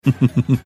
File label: chuckling quietly